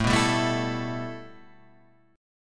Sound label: A 7th Suspended 2nd A, Guitar Standard; A7sus2 strummed